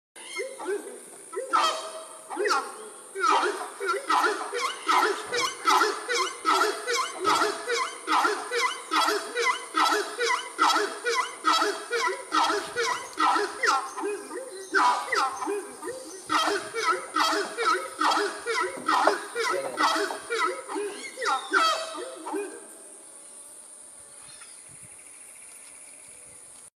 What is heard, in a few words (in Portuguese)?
Canto do anhuma cornuda